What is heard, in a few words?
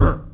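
pig bass drum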